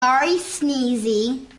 sorry sneezy

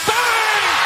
bang; mike breen

